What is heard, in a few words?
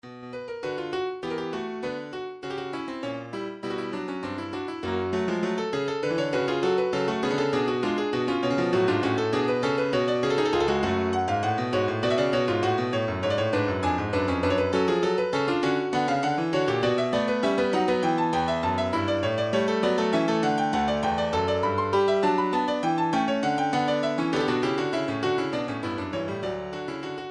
piano, midi